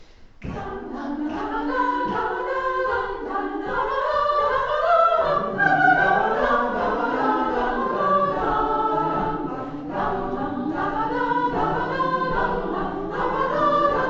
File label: Menuett